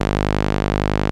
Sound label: ARP 2600 G#2, synthesizer; Hollywood Edge - Giorgio Moroder Rare Synthesizer Collection